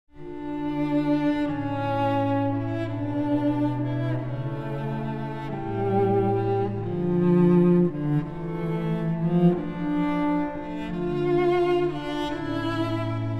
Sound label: Concerto for Cello, in F; II. Largo; Vivaldi; Complete Cello Concertos